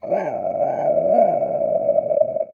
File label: Animal Mammal Carnivore Cat Bobcat Growl; animals; AdobeCC